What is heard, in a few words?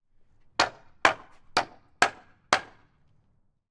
hammer on wood; sonniss gdc